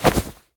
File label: fire; CosmicRage; combat